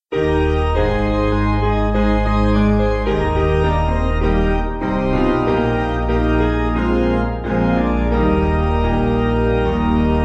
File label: Piano